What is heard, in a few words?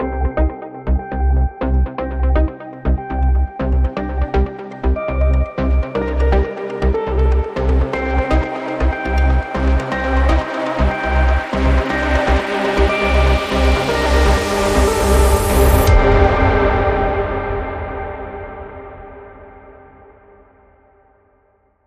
Synths